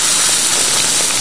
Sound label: laser flesh; npc; Garry's Mod; hl2; stalker